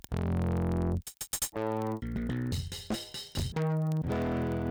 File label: Saturator; Virtual Analog